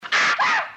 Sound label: aah haah